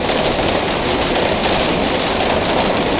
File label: roll skylights, terrains; Tony Hawk's Project 8; XBOX